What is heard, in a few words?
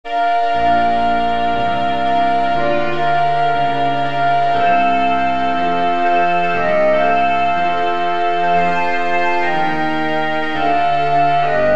sans trumpet